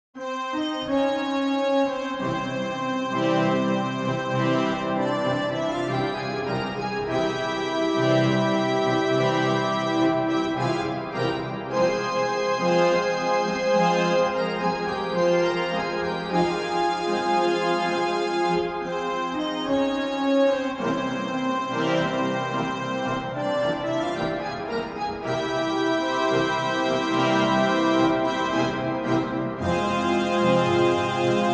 organ as accordion; Organteq 2

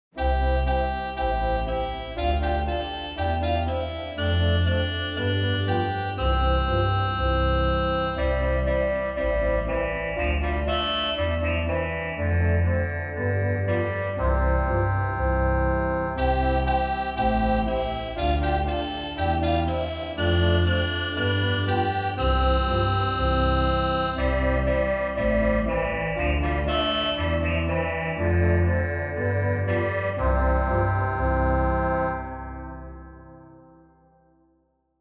OrganTeq Reeds

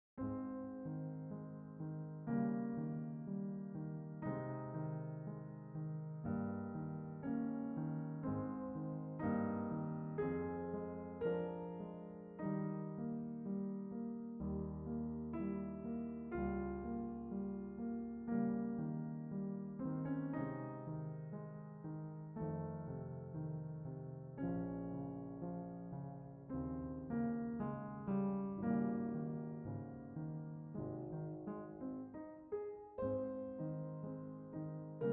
Pathetique Mvt 2 Bluethner Concert Recording; Beethoven

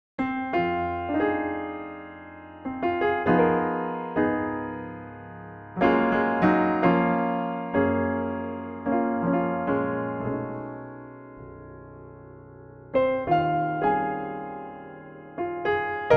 Steinway B

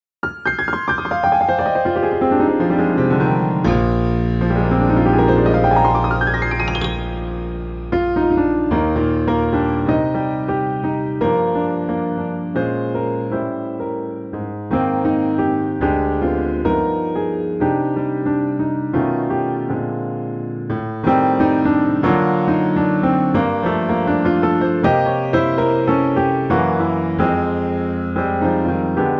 piano test

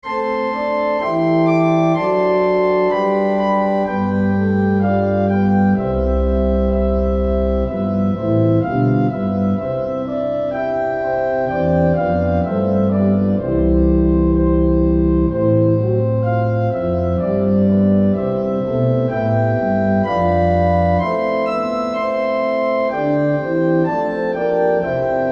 harmonizer; Google

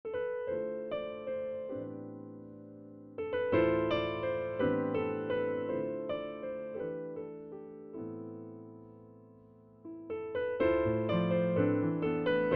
pianoteq White Grand

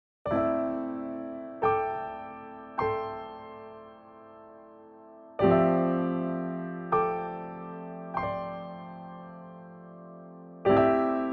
IMPRO FOR COLOR TEXTURA

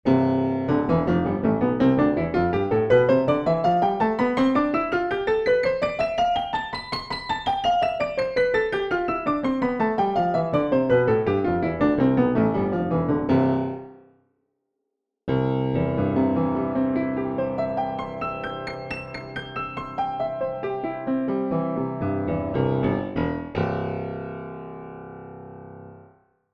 c Major Streicher; Original

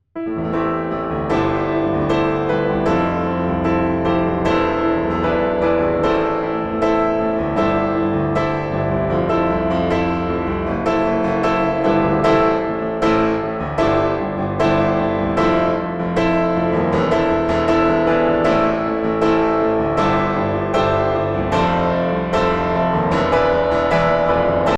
Hamond sound

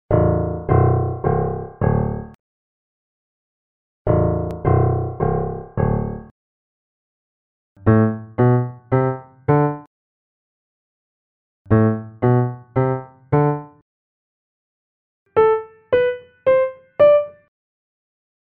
U87 v C414 Omni

